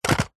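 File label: leisure remote control car remote plastic set down hard carpet; zapsplat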